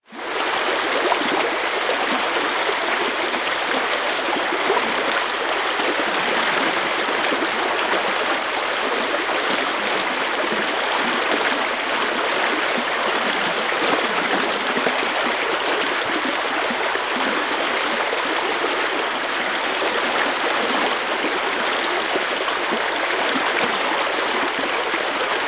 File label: Small River